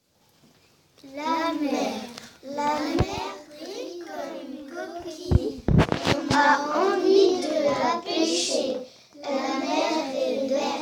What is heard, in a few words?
Vocal